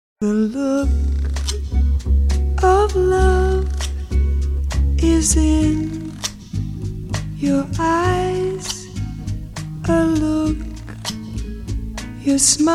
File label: extra boy no piano